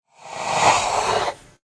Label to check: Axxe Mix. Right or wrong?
Wrong